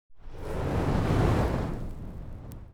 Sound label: ambience room forge working layer